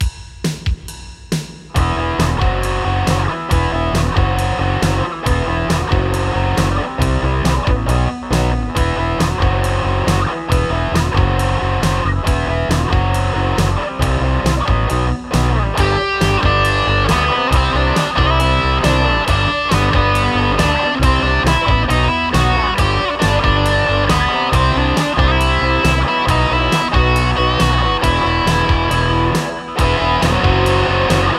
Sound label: bass practice; weeklybeats 2024